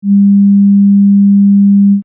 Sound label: oscill